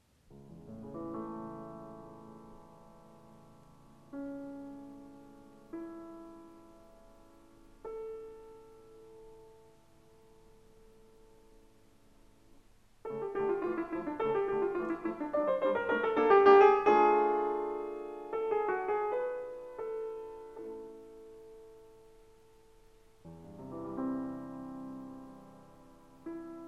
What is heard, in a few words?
Piano Sonata No. 17 in D minor; Wilhelm Kempff; Beethoven; Piano Sonatas